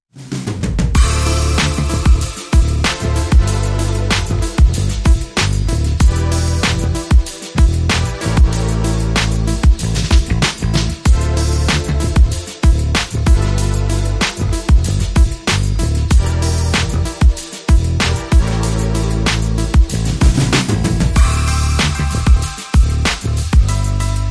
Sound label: No Piano Reduced Arrangement